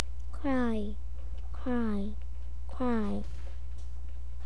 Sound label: cry